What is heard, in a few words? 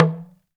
TALK DRM, Heart of Africa; Spectrasonics